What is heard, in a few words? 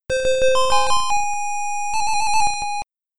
Vine; Pitfall II; SC-3000